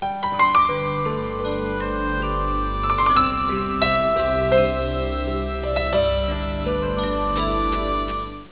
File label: piano